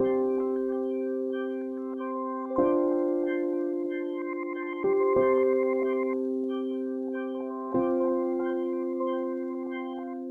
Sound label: experimental melody 93 bpm C maj; bespoke - Floral v.2; experimental melodies